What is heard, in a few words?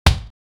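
brick breaker game, ball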